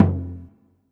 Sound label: Percussion; Vengeance Samples; VEH2